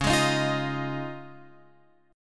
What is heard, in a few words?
Db Major Add 9th, Guitar Open F; strummed; x,8,8,10,8,10